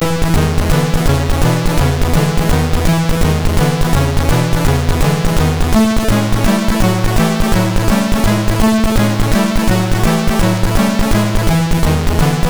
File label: Rock n Roll, sid MOS6581R3